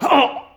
Hit; Blind Quest - The Frost Demon

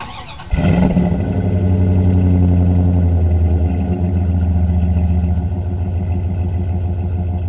Truck Dodge Ram Start Loop; Trucks

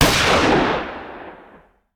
Hand Gun; Sound Ideas; Guns

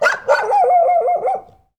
bad roll; Dice World

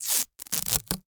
Duct Tape Tear; Miscellaneous; 1001 Sound Effects

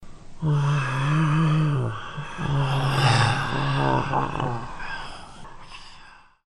Sleepy Monster; blindside

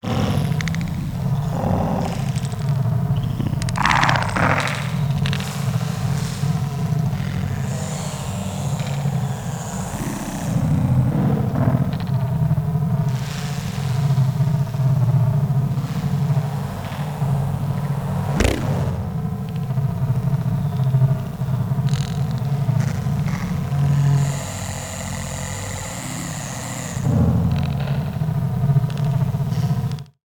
TEXTURE Tired Machine; boom library; Neon

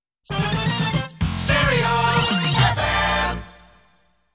Stereo Fm, jingles

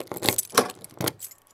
Auto Door Unlock; Automobiles

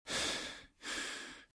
RadwinIsland

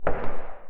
footsteps hallway; Apotheorasis

